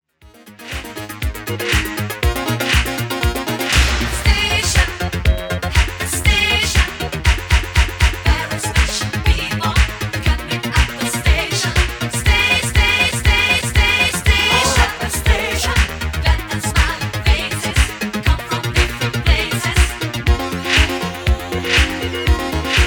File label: Scratch Remix